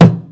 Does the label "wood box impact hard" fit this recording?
Yes